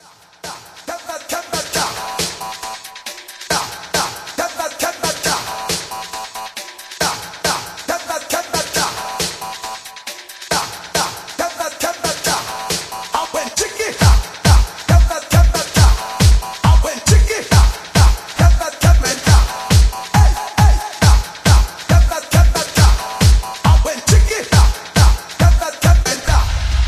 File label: Russian Dance Extended Mix